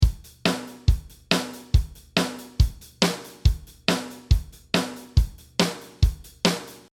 Straight Rock 140

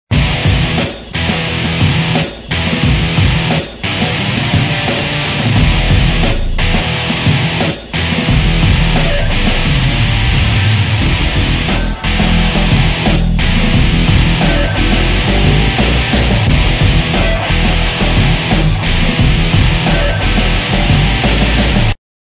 Cool Lead Tones

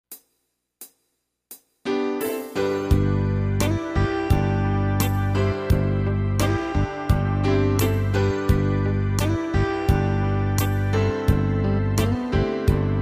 Tyros Style Pro Lv8 Beat

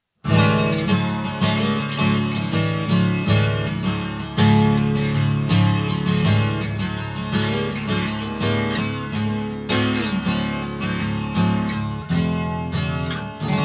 miced head grange

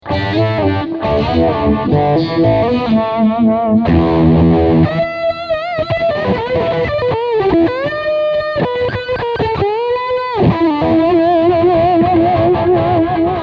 Uni-V Fuzz

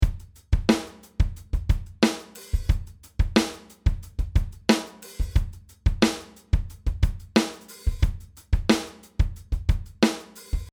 Soul Funk 90

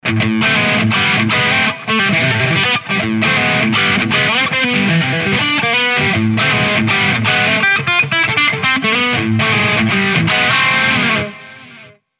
Big Mean Scoop

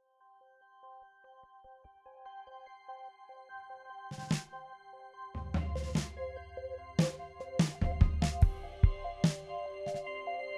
Drum Kits; Reason Drum Kits; Sac Ma Deek

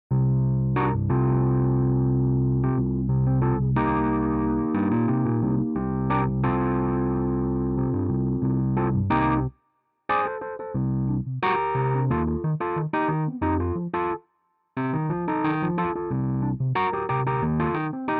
Rhodes Ring

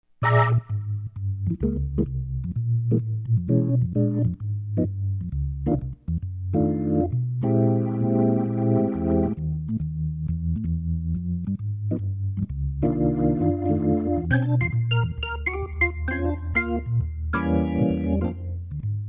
Cool Blues

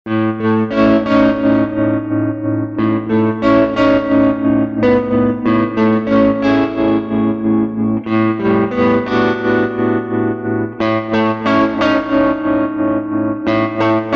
BARITON

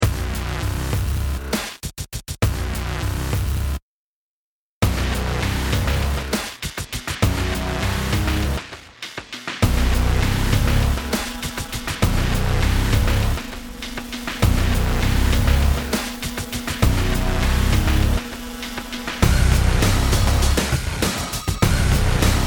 Hybrid Aggression Electric Beats

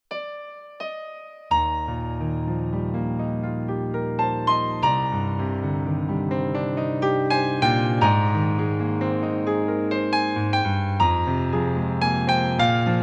Acoustic Piano

